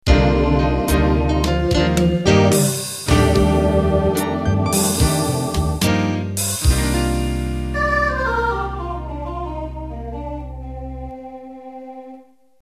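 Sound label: Slow Jazz End, MIDI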